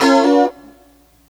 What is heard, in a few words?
C FUNK CHORDS, Guitars; Spectrasonics; Hans Zimmer Guitars VOL-1